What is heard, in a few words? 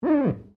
GLASS SQUEAK FINGER